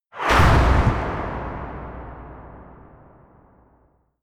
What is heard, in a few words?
heavy metal impact, metall; hits explosionen